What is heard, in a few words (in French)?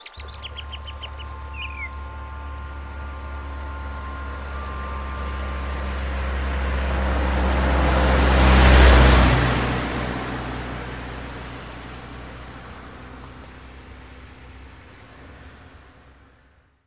vibra